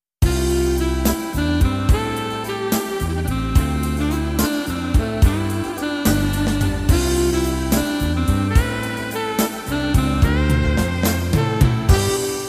SLOW

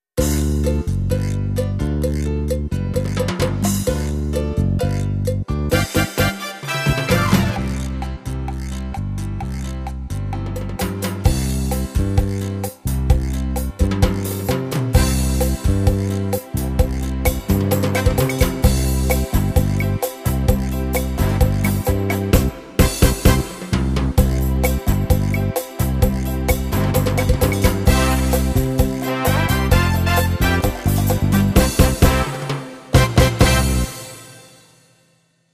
Chacha

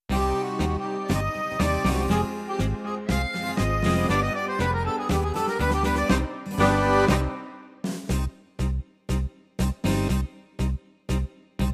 TANGO

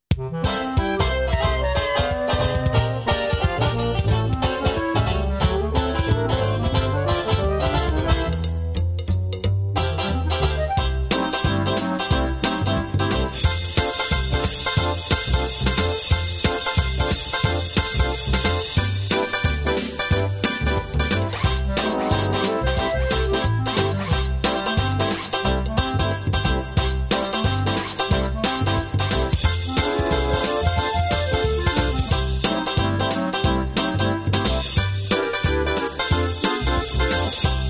dixie

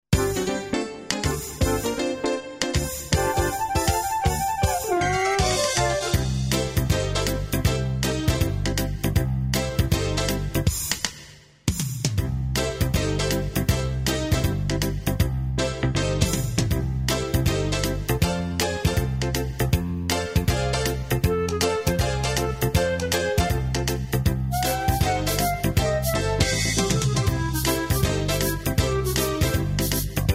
honky ton

